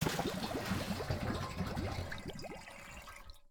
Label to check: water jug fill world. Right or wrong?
Right